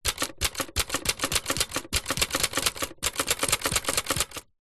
office typewriter typing typebar front close to keys vintage; 1960 lemair helvetia